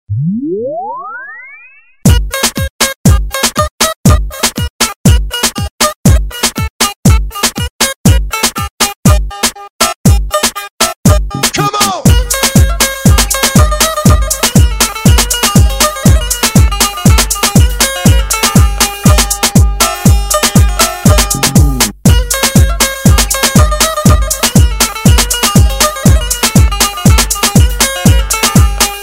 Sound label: Turkish Beat Bass